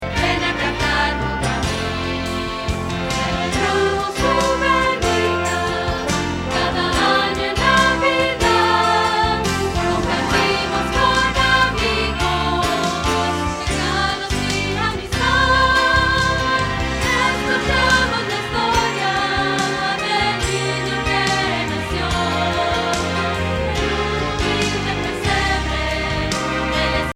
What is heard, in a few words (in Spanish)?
Contralto